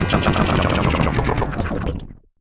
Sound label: Transition Spin Down Descend; Production Element